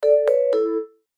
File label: chime mallets harmony; Chimes; Multimedia; Blastwave